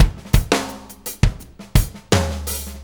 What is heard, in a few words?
Drum Tools, Loops; No Small Feat; Sonic Foundry (Sony Creative Software); Various Loops